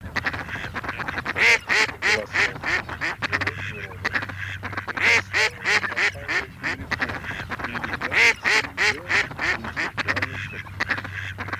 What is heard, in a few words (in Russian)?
Кряква Germano; plurifon